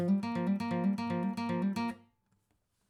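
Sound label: acoustic pattern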